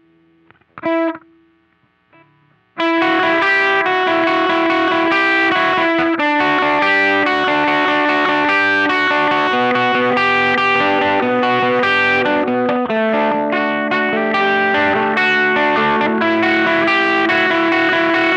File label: Riff Slow